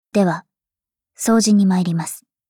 battle warcry

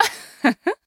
laugh; MissFortune

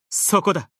attack

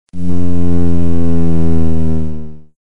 Air Plane Fly

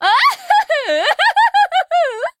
laugh; MissFortune; jp

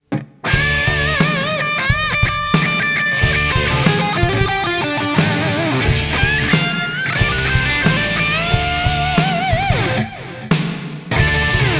Metal; PvZ2

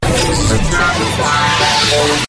stamper

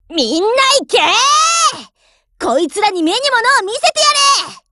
warcry; 唐斯

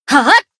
Battle Shout; Karin Bunny

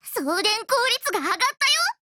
levelup; Max

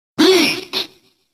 Beat

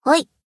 Battle Shout; Natsu

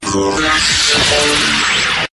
flash

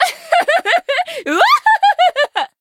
laugh, jp; MissFortune

